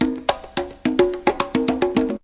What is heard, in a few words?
manypitches conga, 107 bpm, drum loop; 101 to 110 bpm